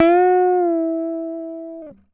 GTR TRIK, Jazzistic; Univers Sons

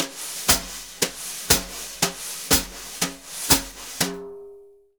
BRUSH, Jazzistic; Univers Sons

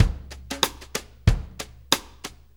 Country, Drum Tools; Sonic Foundry (Sony Creative Software); Loops & Samples Drum Tools

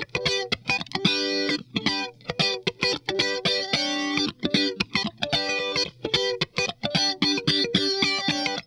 SQUASH, Funk Guitar; 90 s Sample CDs; Zero G

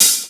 Hats and cyms, Funky Drums, Kit hits; Neil Conte's Funky Drums From Hell (1992)

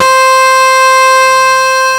WAILING C5; East West - Global Sounds; DIRE LIKE

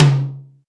TOM, Drums; Best Service; Real Mega Drums VOL-1

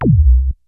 MG1 KIT, MOOG; Rubber Chicken Software Sample Bank

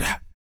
TEETH CLEAN, Vocal; Spectrasonics; Vocal Planet; Blues Soul

